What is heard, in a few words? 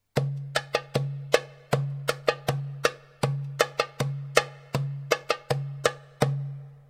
darbuka